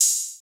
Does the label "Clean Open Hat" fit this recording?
Yes